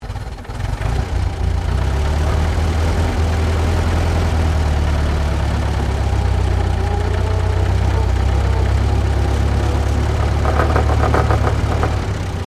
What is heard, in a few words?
Truck Chevrolet 1926 30cwt Accel